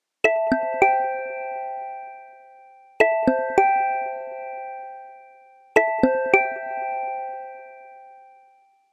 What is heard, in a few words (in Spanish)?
ringtone mas rapido; VTR Chile